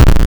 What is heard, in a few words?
Explosion; Arkanoid